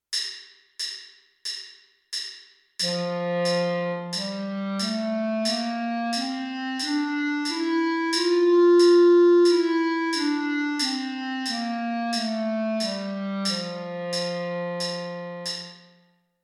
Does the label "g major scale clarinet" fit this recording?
Yes